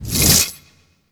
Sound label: Impact Retract; War Pact; Warframe; Priest